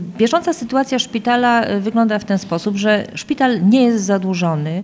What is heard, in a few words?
bandola